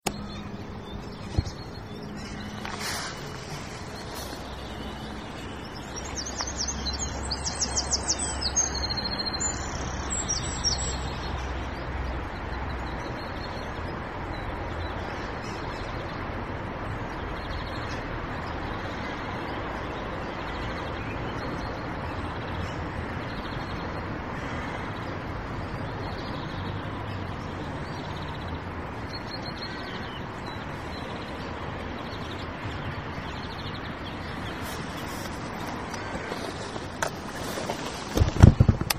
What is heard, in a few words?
a soundscape from st john s graveyard kilkenny